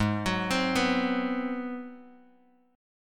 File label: Ab 7th Sharp 9th Bass Standard, chord; Ab7#9 arpeggiated; JGuitar